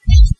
Heart Beat

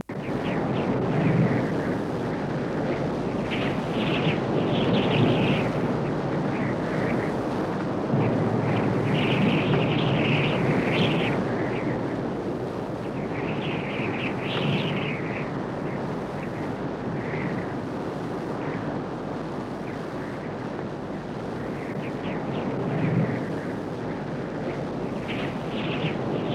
Blustery Whistling Wind

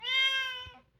Cat Gato Meowing

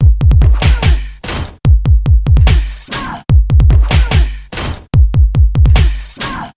Drum Loop

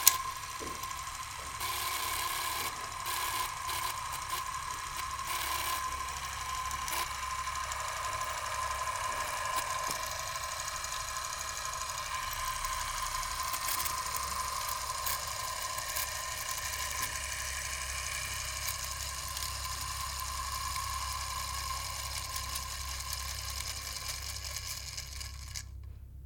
Film Camera

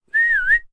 Attention Whistle

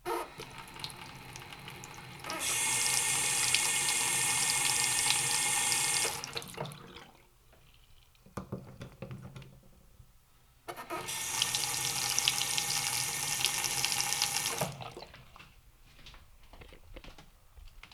Faucet

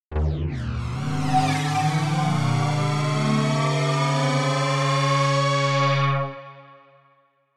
Portal Gate Opening

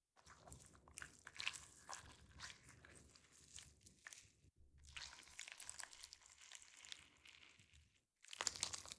Blood Gore Slime Squish

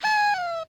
Honky

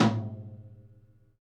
Tom High Vol

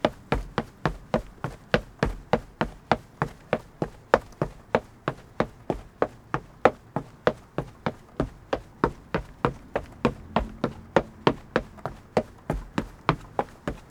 Running Footsteps On Wood

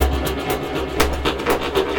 Convoluted Bare Bone Loop 60 Bpm

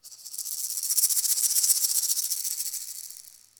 Maracas Fast Intro

